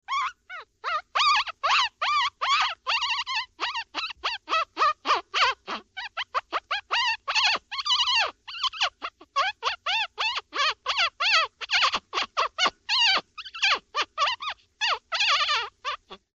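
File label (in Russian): щенок жалобно скулит имитация голосом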